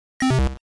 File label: computer close; Pokemon Essentials; Pokemon Iberia V1.03